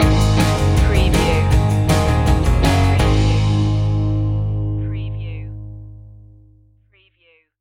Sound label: surf stinger